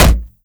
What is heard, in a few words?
impact, wood chair